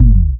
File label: KICK PRO -L, Disco House; USB Soundscan vol.20 - Fresh Disco House I